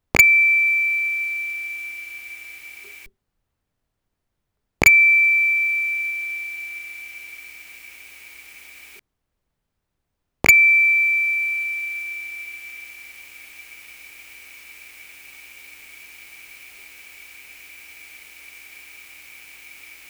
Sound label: sensor in back yard